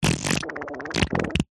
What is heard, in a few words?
human baby poop wet squelch